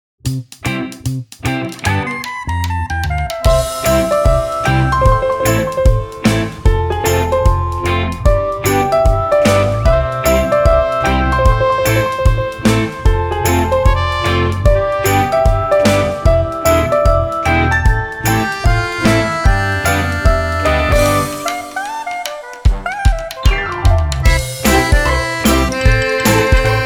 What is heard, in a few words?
Good Vibes